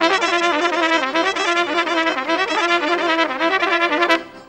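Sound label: clarinet trumpet; Best Service; World Colours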